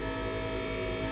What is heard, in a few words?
tank turret rotation loop; blockade classic